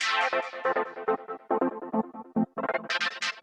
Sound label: TECHNO EXTRA 140